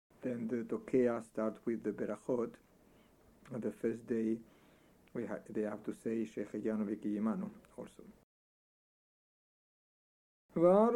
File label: Rosh Hashanah Shaharit Blessings for Shofar